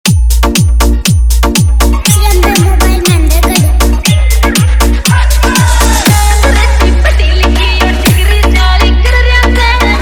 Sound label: Dj Remix Hard Bass Vibration Mix